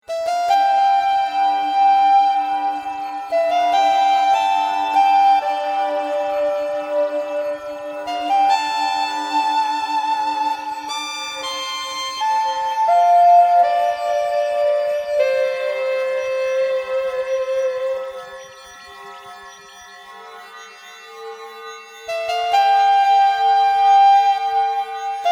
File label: metalsax